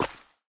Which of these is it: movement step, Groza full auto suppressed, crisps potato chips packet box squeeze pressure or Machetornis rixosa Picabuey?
movement step